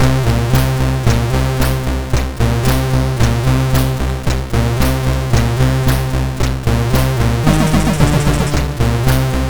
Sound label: Invader Alert, sid MOS6581R4